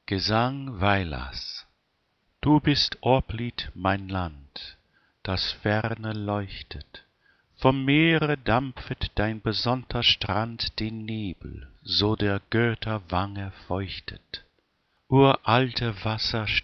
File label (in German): Gesang